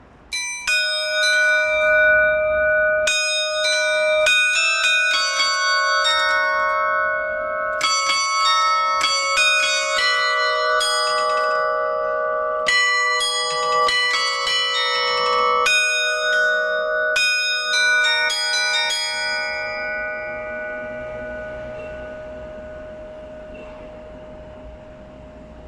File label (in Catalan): campana